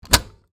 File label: closing tape cassette